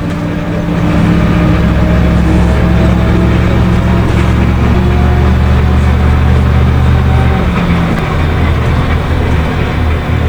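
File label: drive loud, vehicle; liaz677; drive3gromko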